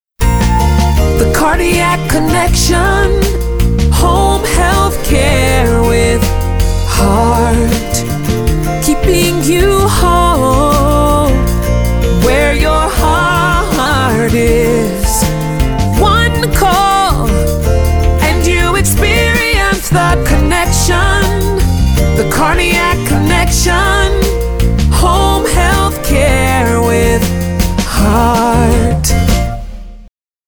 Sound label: jingle